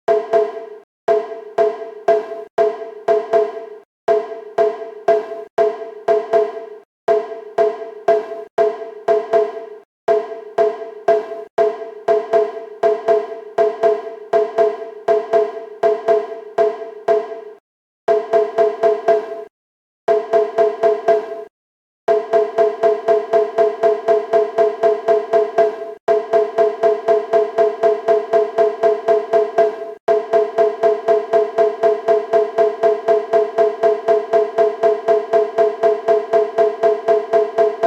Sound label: odori uchi shime 120bpm